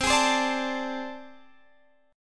A Minor Major 7th Double Flat 5th

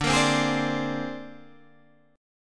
C 7th Suspended 2nd Sharp 5th D

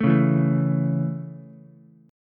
A Major, Ukulele; strummed

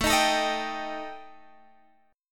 G Suspended 2nd Sharp 5th A

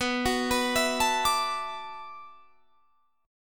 B Minor 11th B down Guitar Standard; Bm11; arpeggiated; capo=2

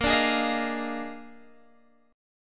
A sharp 11th, Guitar Open F; strummed; JGuitar

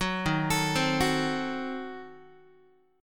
Eb Diminished 7th Eb down Guitar; Ebdim7 arpeggiated; 4A,4E,5A,5D,5G#,6C#; JGuitar

